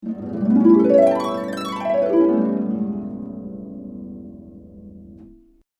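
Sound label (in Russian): арфа переливы вверх и вниз загадочная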